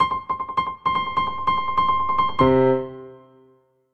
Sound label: Phrase Tremolo & Octave C 100bpm, Grand Piano; MIDI Clips; Ableton; Factory Packs